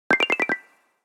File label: transition